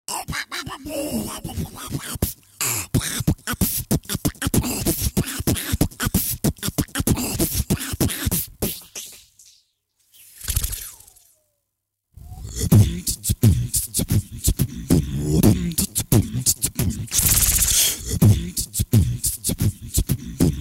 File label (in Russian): Битбокс; Битбокс для чамбовского батла